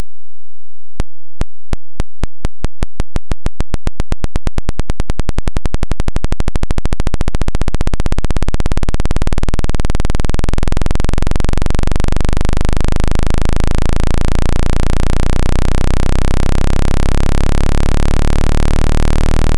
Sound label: Carre Waves 0 40 Hz; Suite de "TACs" de fréquences variants de 1 à 40 [Hz] en 40 secondes.